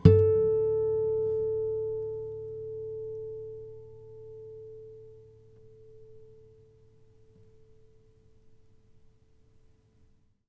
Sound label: harmonic, strings harmonics; 4 harmonic